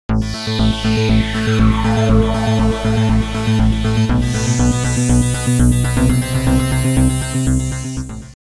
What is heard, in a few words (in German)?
wavesequenz